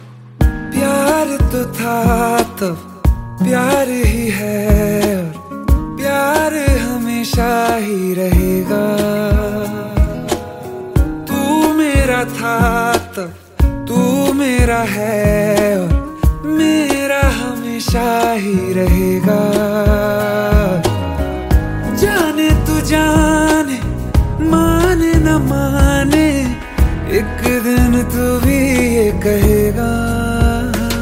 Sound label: Romantic Song